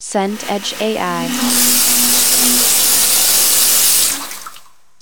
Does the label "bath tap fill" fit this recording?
Yes